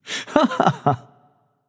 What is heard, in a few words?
heh heh; smite; tsukuyomi